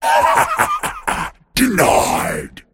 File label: deny pain; dota2; pudge